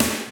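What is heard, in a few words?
processed snare, drums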